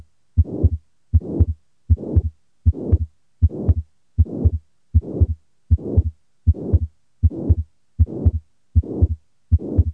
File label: Systolic Pulmonary Stenosis